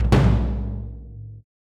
drum; bannerman

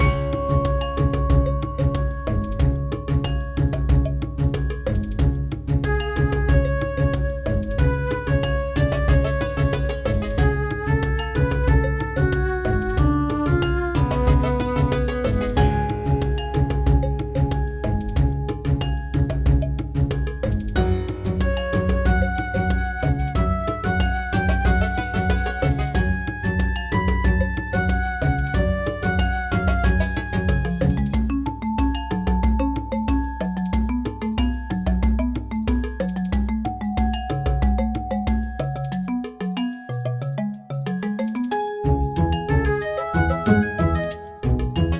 Combat

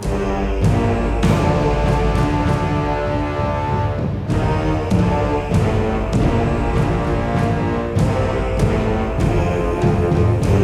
Exploration Music Loop; Iron Tides macOS Windows gamerip 2017

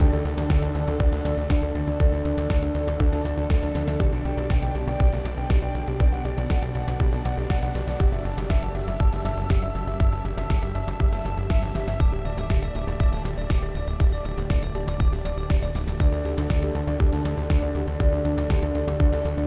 Dash, 120BPM; TheView Act3

